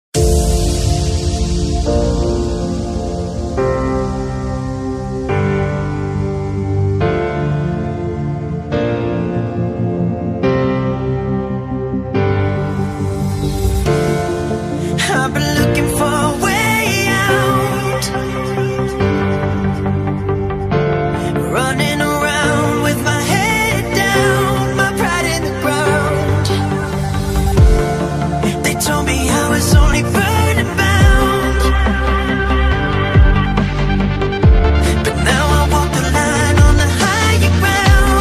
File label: Dubstep